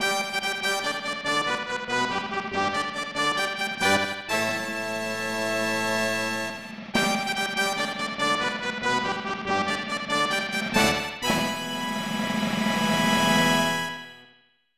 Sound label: Fanfare; Derby Stallion 96; SNES